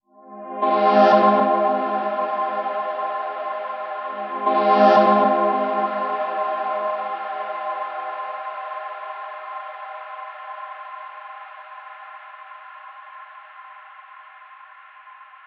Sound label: Cosmic Pad Bloom